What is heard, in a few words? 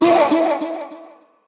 Samurai death